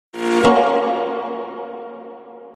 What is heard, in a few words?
Modern Sega sound